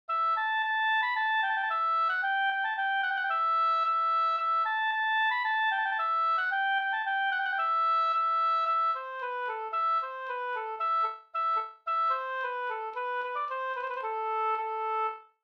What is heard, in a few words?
polka